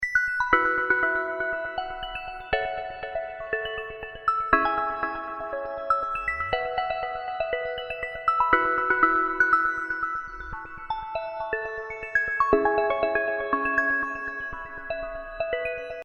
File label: Synths